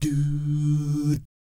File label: DOO, Vocal Planet Jazz